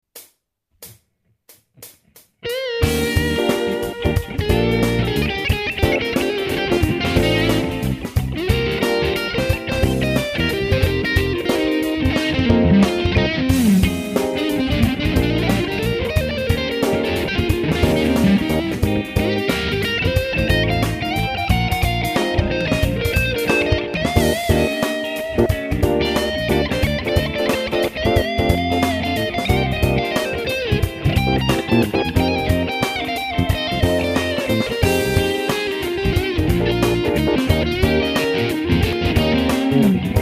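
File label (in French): Style jazz & fusion, PRS Cu22; guitare; Sunrise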